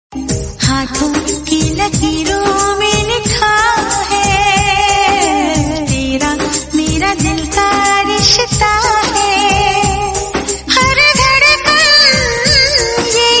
Old Song Ringtone

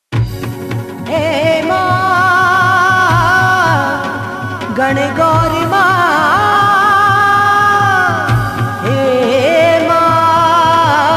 Gangor Song Ringtone

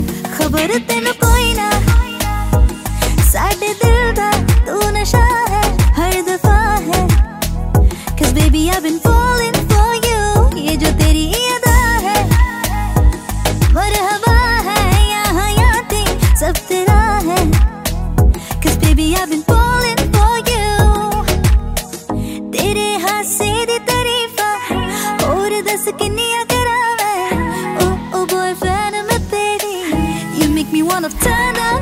Female Version Ringtone

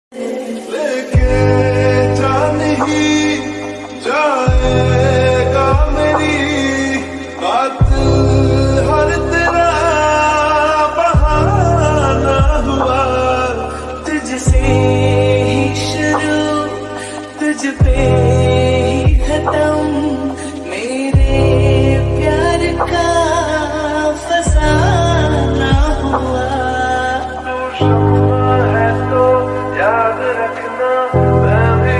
Sad Ringtone

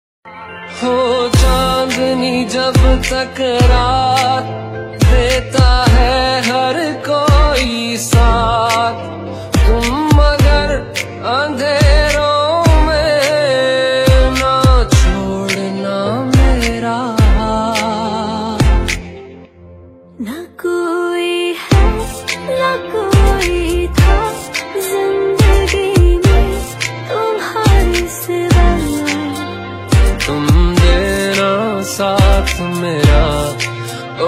Romantic Hindi Song Ringtone

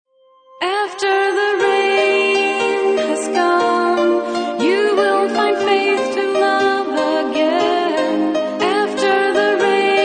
Romantic Hollywood Song Ringtone; ringtones

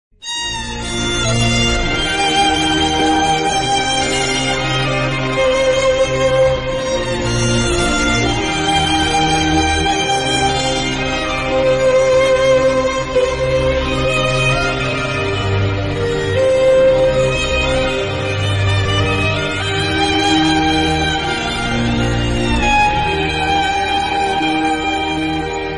Music Ringtone; Raja Rani

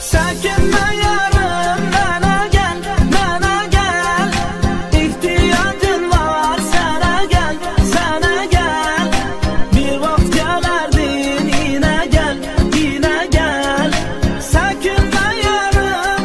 Arabic Song

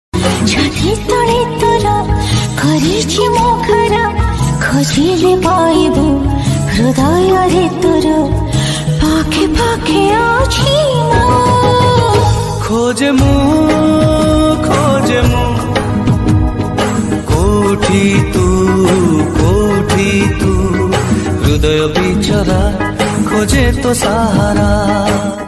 Odia Ringtone

